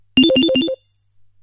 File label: CPU Alert; MMX